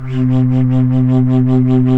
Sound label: FLT, Bass Flute, BsFlt vib; Roland LCDP04 Orchestral Winds; 90 s Sample CDs